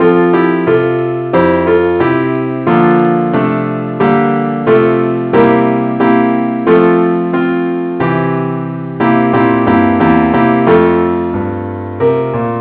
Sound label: keyboard only; key f; Gwalchmai